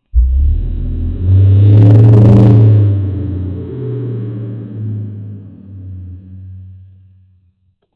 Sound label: cool awesome truck hulk, horns